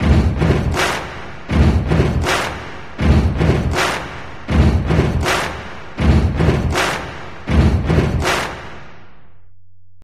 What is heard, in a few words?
Stomp Clap; NBA Jam Extreme; PSX; Saturn, Windows